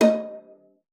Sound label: D#5, VIOLA FINGER; Xsample VOL-5